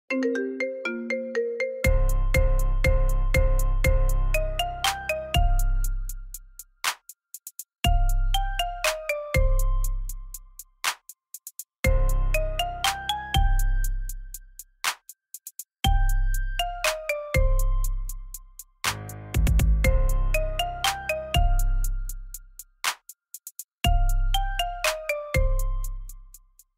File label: Marimba Remix